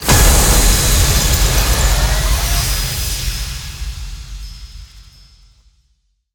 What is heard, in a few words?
super Mega Win Text Change; secrets of christmas